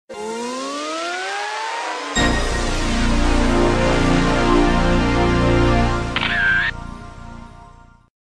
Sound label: Msg Tone